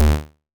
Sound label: old school puffy top end bass drum sound d key